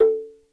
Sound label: African, African rhythm; Crypton - DrumBase5000; AFRICNRHYTHM